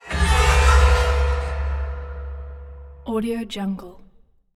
asylum horror soft impact; esmteam

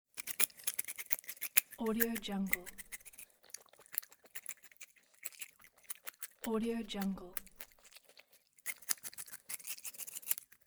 cartoon rabbit eating

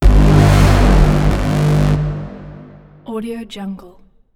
music growls

